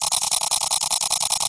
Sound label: engine loop; scanner; hl2